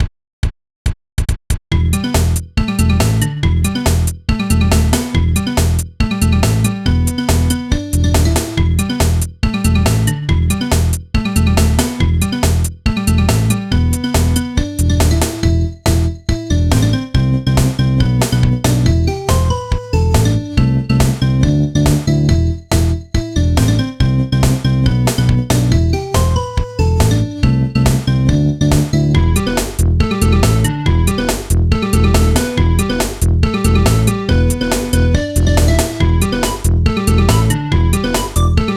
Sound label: bloop